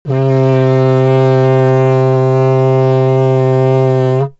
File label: foghorn; TamTamMicro.activity